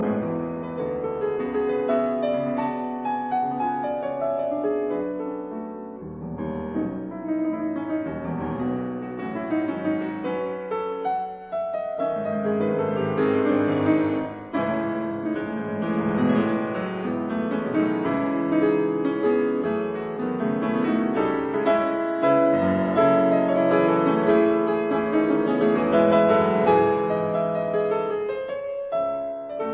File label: Mazurkas in C sharp minor Agitato; 9 Mazurkas Op 25 No 5